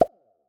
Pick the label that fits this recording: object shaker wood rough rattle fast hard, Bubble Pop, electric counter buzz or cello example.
Bubble Pop